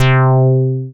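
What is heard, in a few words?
synth analog; Best Service Gigapack 1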